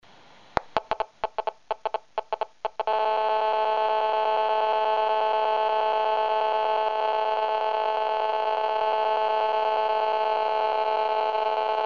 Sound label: GSM Tel